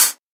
juicy pedal hi hat e key, Royality free hat one shot tuned to the E note. Loudest frequency: 8301Hz